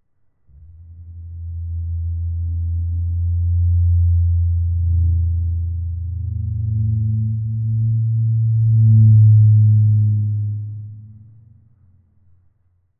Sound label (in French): Crissement; design sonore